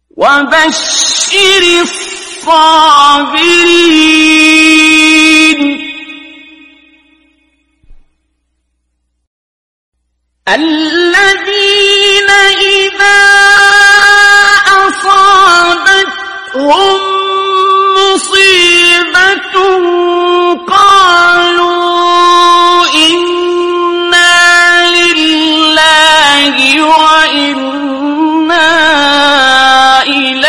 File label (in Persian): تلاوت آیه سوره بقره; آیه 156; استاد عبدالباسط